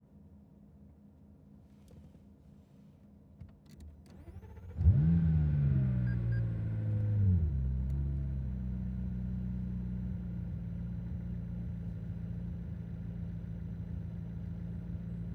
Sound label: McLaren 570S, Onbrd, Start, Idle, Steady, in Neutral, Blips, Off, Interior; McLaren 570S 2016; Pole Position